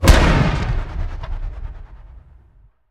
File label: shout whirlwind